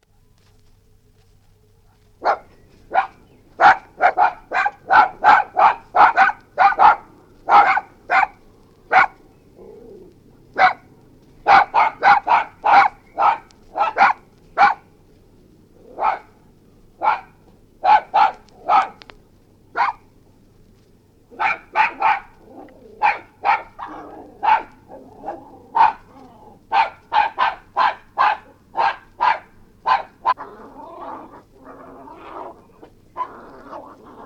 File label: Maltese Terriers, two yapping & fighting; Dog; BBC